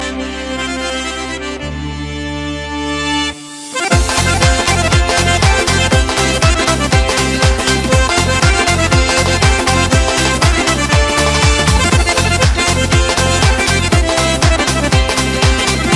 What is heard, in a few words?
Lezginka